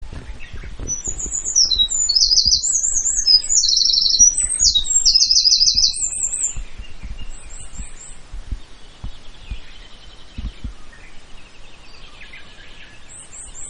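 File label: Eurasian wren; misosaza; higasi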